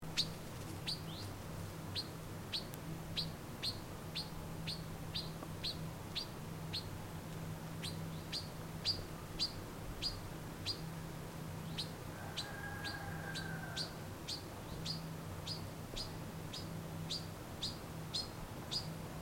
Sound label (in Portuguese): papa-moscas-preto